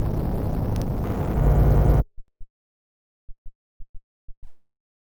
Rando Syn, malfunctioning synth samples, Random Synth Failure